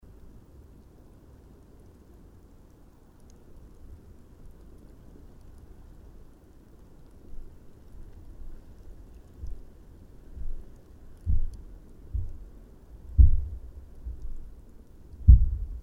Black Sand Basin Black Sand Pool Imploding Bubbles Binaural